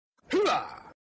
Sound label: whoo